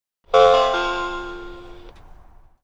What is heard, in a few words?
Enable chime, Train; OpenBVE